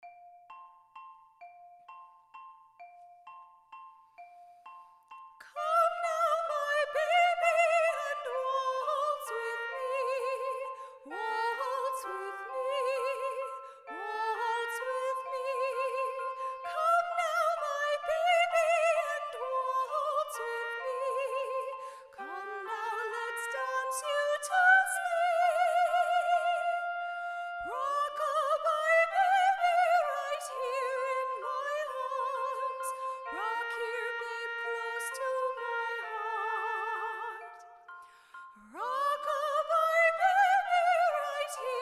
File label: Lullaby Waltz